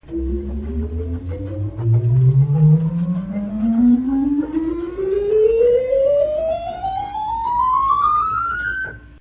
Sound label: bourdun, organ